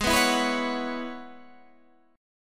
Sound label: Gb 7th Suspended 2nd Suspended 4th Ab